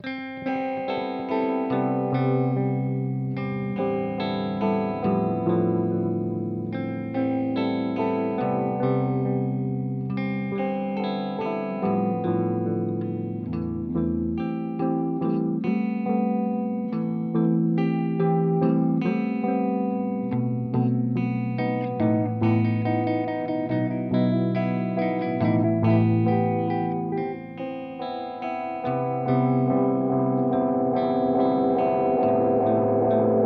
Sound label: Analog Delay